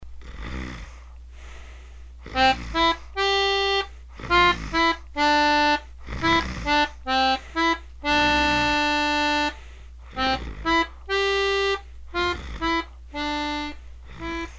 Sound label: LULLABY